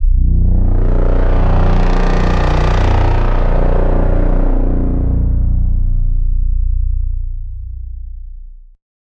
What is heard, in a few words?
dark synth oscillator; mixkit